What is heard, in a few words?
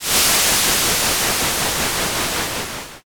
grill